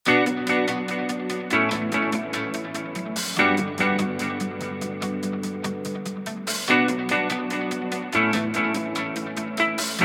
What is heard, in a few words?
Corporate